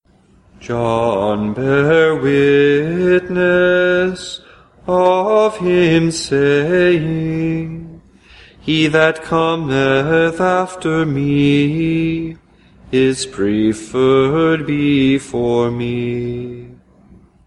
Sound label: Ember Friday Magnificat Antiphon